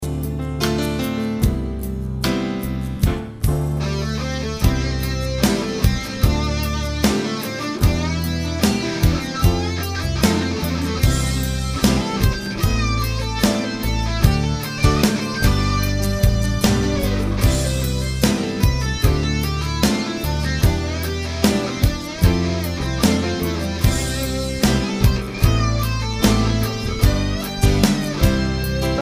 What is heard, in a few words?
solo